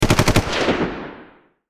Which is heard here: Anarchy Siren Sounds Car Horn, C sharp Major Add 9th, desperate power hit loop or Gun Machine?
Gun Machine